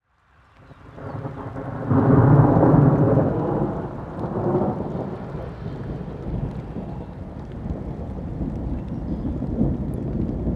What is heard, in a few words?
nature thunderstorm rain field illinois usa; felix blume